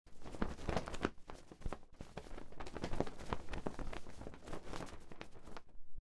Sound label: flag wave